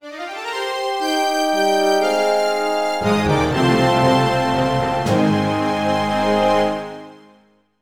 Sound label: Victory; Airspace2; Night at the Museum 2 the video game 2009; nds